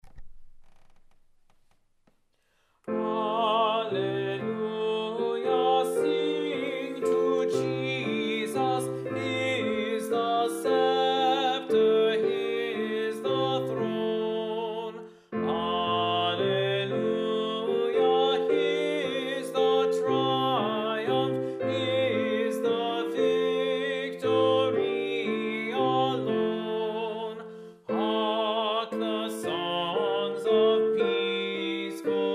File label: Tenor; Alleluia! Sing to Jesus; Selected Gregorian Chant & Hymnody